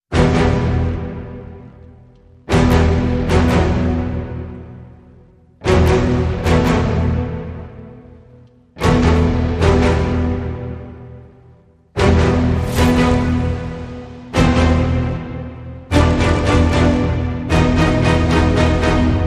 No Choir

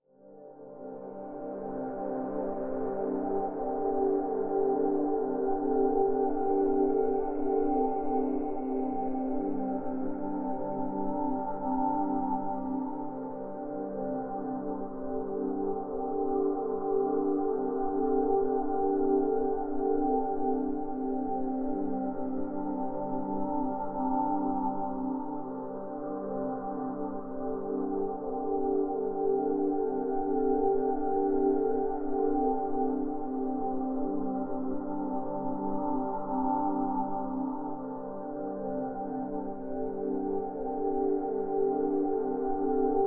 Pads

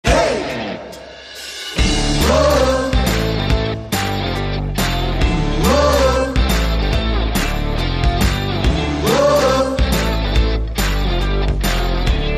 Celebration Chant

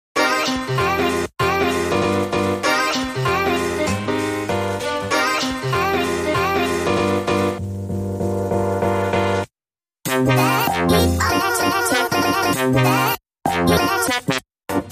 No Drums No Bass

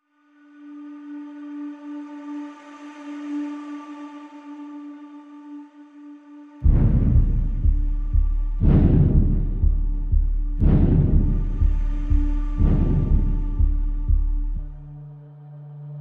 Lockdown No Perc

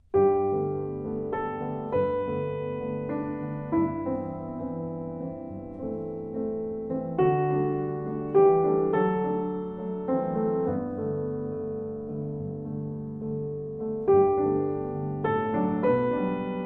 Solo Piano

